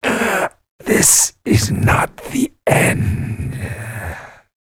death; the hidden

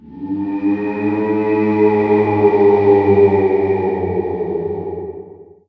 zombie call

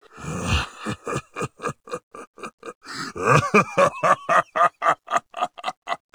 laugh; garrosh

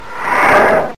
grunt; revenant

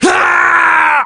death; snake

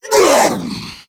chase; slender

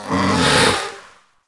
serpent; tf2pets

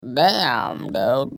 fart reply; Oddworld; Munch

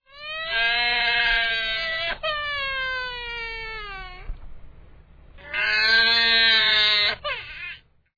cry; hl2dm

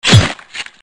bow impact; hl2dm; oppressive territory

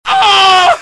death; hl2dm

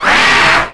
alien pain; xenomorphs

